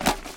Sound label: fire step; Golden Crayon Sounds